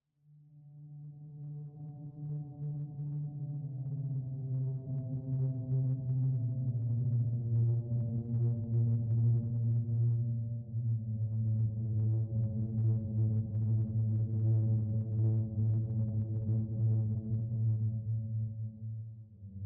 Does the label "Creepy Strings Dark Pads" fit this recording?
Yes